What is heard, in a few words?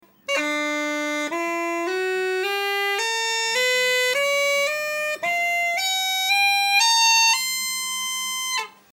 scale, uilleann